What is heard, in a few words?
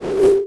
Science Fiction Sci-Fi Electronic Automatic Door